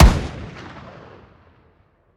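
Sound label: weapon ar fire player atmo exterior; gmod; viper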